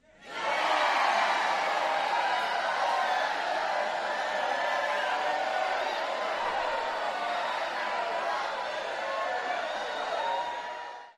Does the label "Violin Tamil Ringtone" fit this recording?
No